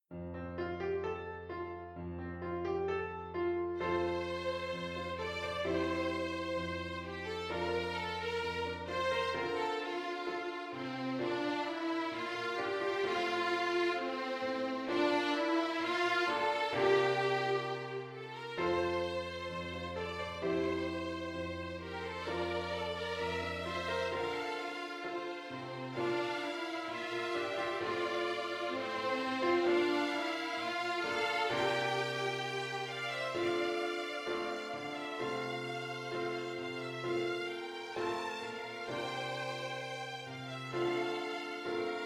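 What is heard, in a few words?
Violin Duet and piano accompaniment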